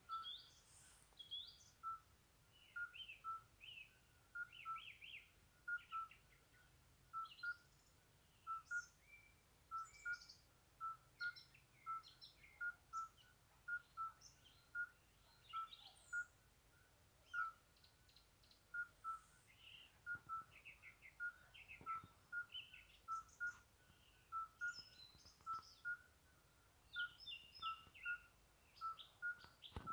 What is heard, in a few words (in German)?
Geburtshelferkröte